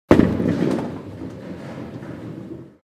Bowling ball rolling down lane

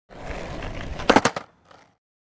Skateboarding falling on the ground

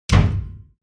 Hit on broken piano keyboard

